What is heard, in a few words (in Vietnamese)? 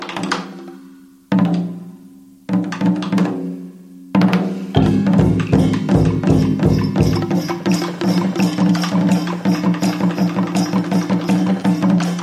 Tieng Trong Hoi Xuan